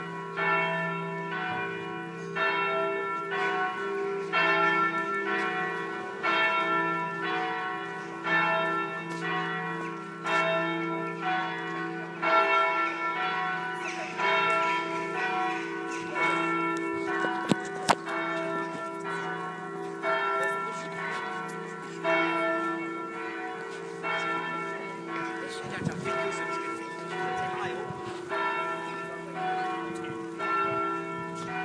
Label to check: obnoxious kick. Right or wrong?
Wrong